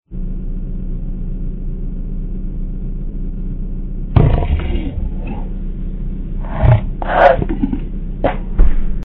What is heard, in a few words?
headshot; vapesquared